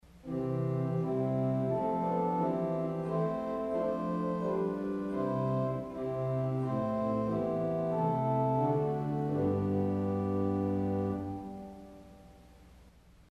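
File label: Geigen Diapason St Anne, organ stop; CulverAcademy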